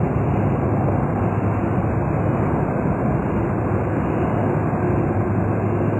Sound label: jet crappy; space kitty